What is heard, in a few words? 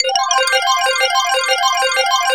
sequence loop; Zero-G Ambient 1